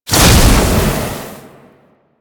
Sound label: Alchemy Vent Turn Off; Warframe; Entrati